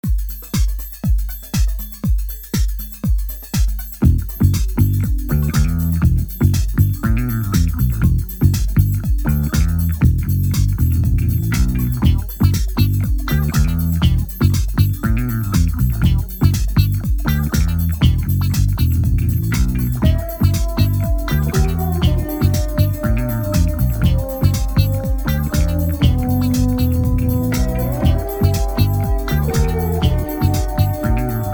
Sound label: office funk